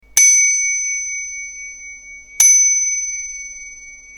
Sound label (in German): Fahrradklingel Flexibel Messing